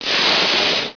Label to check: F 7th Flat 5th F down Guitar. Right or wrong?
Wrong